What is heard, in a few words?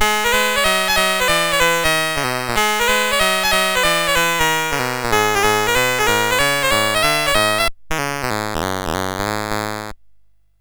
sid CSG8580R5; Metro-Cross